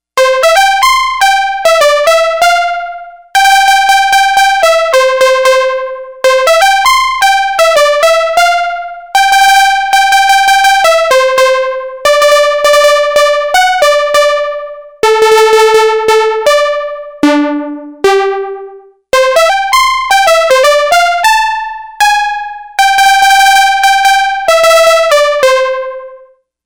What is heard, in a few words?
STEEL DRUMS Lead Pan